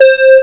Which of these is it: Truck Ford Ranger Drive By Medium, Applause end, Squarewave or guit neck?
Squarewave